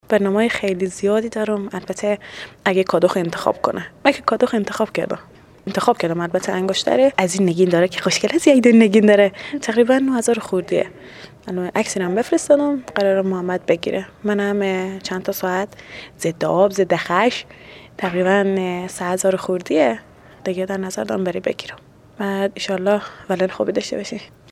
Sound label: ssshhhh